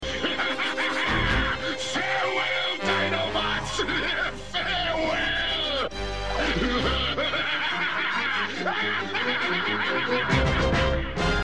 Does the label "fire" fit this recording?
No